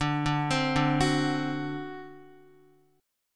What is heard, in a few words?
D 7th, Guitar; D7 arpeggiated; JGuitar